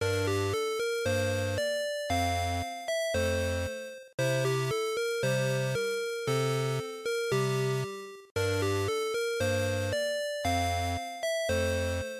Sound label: GB RPG Field Loop115